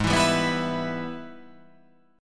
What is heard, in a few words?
Minor Major 7th Sharp 5th; Gsharp